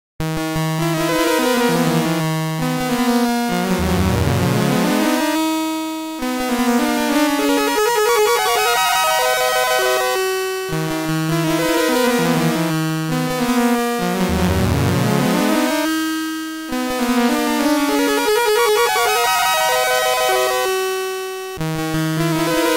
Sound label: Dungeon